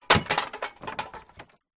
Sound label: household trash into trashcan cans glass